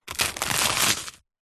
human eat bite single into crispy traditional french baguette; zapsplat